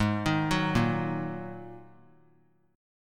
G sharp 7th Suspended 2nd; arpeggiated; chord; 20,22,21,20

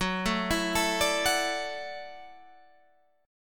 F sharp Minor 7th Sharp 5th, Guitar Open G; chordshape; down

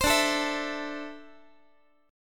D 7th Suspended 2nd down Guitar; D7sus2 strummed

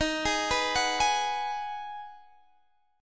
Ab Minor 7th

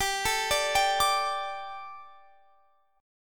G Suspended 2nd G, down, Guitar, Standard; arpeggiated; capo 9

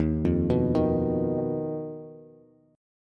Eb Suspended 2nd Sharp 5th, Bass; Ebsus2#5 arpeggiated; JGuitar

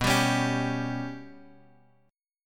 B Suspended 2nd Sharp 5th down Guitar Open G

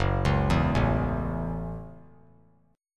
Gb Minor 7th; arpeggiated; JGuitar

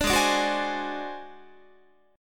G sharp 7th Flat 5th G sharp down Guitar, chord; G#7b5; strummed

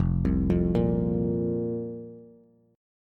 F Suspended 4th F, Bass; Fsus4 arpeggiated; JGuitar

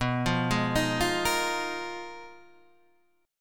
Ab Minor 6th Add 9th, B, guitar chord

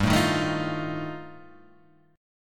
G 7th Suspended 2nd Sharp 5th G chord

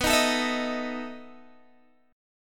B 11th B Guitar DADGAD, chord

